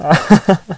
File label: heh